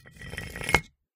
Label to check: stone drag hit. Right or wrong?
Right